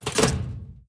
mortar ready; weapons

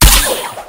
harpoon shoot; weapons

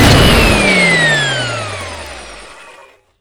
sewing wind down; weapons

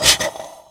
thermite smoke; weapons

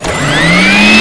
sewing wind up; weapons

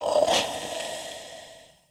pumpkin action charging end, weapons

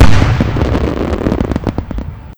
s blast, weapons

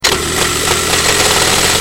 chainsaw wind up; weapons

